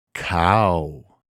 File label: Cow; 動 物; 【赤のフチのカード】; Red